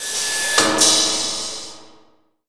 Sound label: vent hydraulics; vendetta